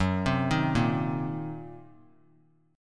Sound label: Gb 6th Flat 5th Gb down; arpeggiated; Gb6b5; JGuitar